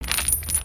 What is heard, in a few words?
chain drag; creatures organic